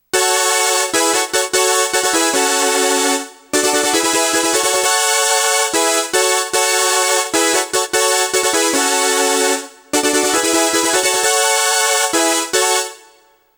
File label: saw, synth